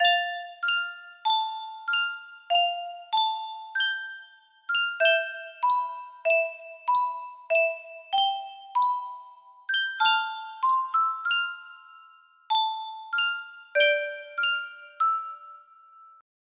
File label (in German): Yunsheng Spieluhr